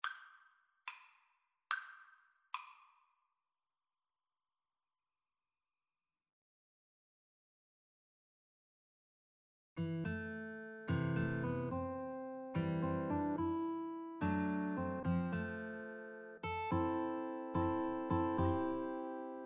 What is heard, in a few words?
House of the rising sun, Guitar Duet